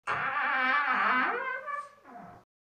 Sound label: door